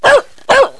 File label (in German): hund bellen; geraeusche tiere